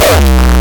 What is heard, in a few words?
Raw Hardcore Kick